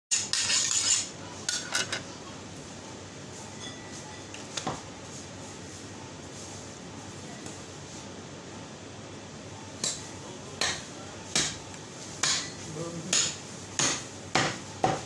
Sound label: Cut meat, شقه کردن گوشت و استخوان